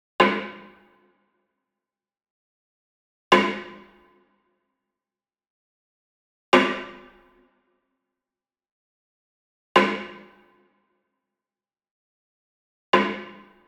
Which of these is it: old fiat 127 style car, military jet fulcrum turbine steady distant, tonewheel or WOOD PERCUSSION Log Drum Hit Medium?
WOOD PERCUSSION Log Drum Hit Medium